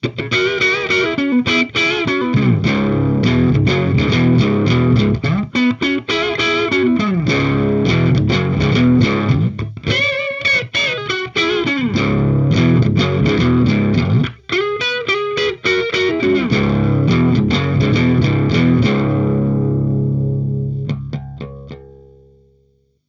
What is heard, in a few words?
blues Gibson V gain5